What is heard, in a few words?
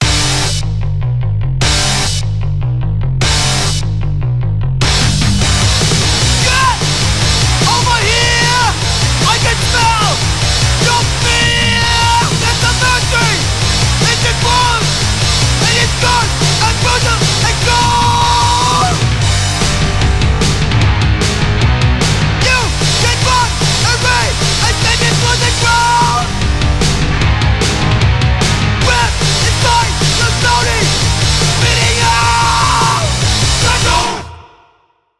side choke